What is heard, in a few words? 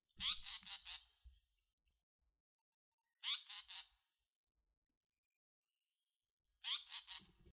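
black paradise flycatcher call note; sankoutyo jinaki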